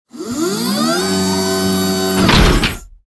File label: Robotic Movement; SoundsCrate